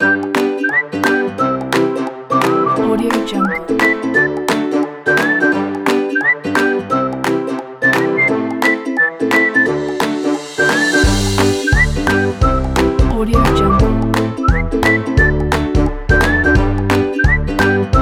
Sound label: happy cooking kit